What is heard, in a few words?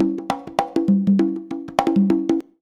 CONGA, Modern Percussion Loops